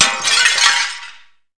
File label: GLASS; REDNECK; RAMPAGER